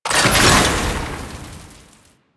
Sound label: Alchemy Grenade Deposit Fire; Warframe; Entrati